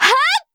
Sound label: charge; ganyu